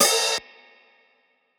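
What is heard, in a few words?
open hi-hat; eddyflux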